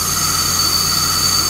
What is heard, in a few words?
loco brake; Mining